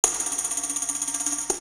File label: Tambourine; Coldcut's Kleptomania VOL1